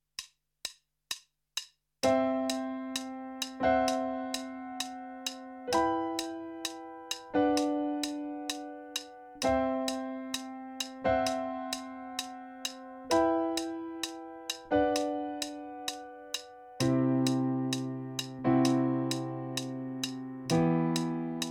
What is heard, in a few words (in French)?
Piano Rythmique Clic; akai apc mini